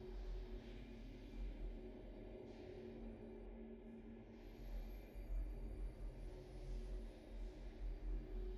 loot reroll idle

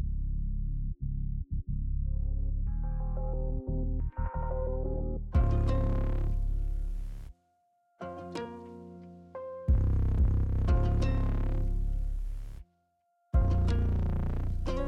bkgd main; tft set10

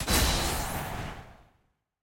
ui map challenge

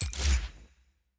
button close; champ select

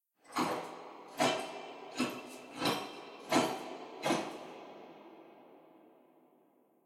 sfx br anim walk lr normal

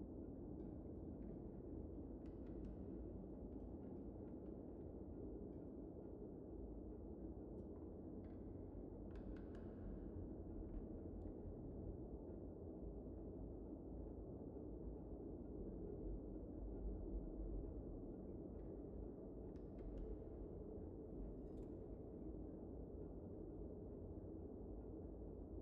amb record; jinx

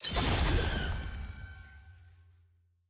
click; skin samira

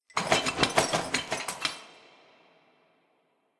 walk scurry

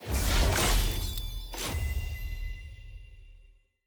loot reveal shard rarity mythic

